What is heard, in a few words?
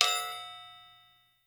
Marble Stn Hit